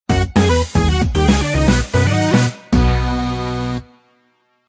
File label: Minigame Draw; MP3